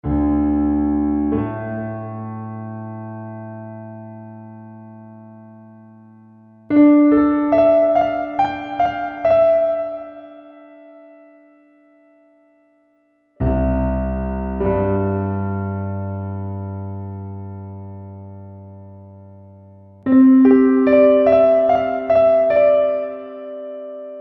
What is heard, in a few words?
Quiet Rest, soundscapes; Disney WorldWide; Wolf Muzik